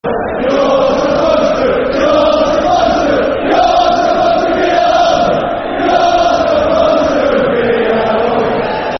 You're Supposed To Be At Home [Football Chant (Soccer Song)]; Swansea City Scfc